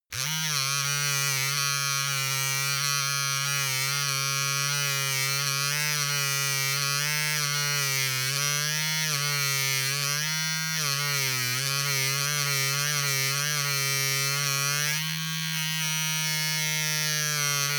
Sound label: razor clatter long; maschinen; rasierer